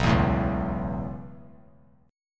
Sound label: B 7th Suspended 2nd Suspended 4th down, chord; B7sus2sus4; strummed; JGuitar